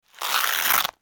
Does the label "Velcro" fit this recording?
Yes